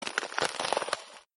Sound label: ice crack sound; Arc of Templar